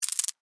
Cockroach Scurry, Animal, Cockroach, Scurry, Short, Movement, Insect, Bug